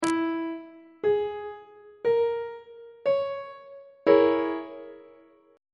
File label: a sharp half diminished 7th 2nd inversion; on treble clef